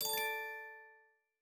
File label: win count up end; netent